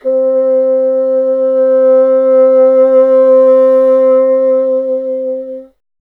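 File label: wind bassoon C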